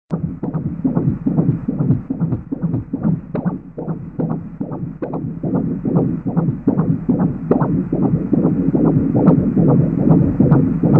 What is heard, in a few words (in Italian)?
cuore fetale battito